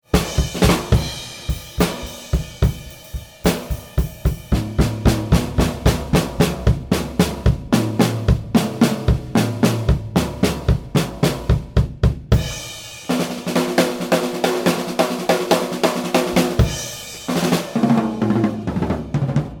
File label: Overhead in Front Of Kit